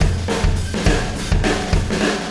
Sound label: Wormhole Beat, Processed Drumkits